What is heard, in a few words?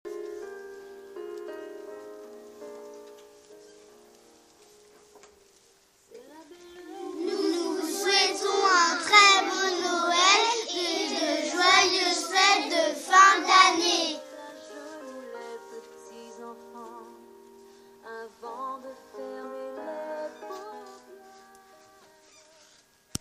Vocal